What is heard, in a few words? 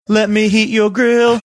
singing let me heat your grill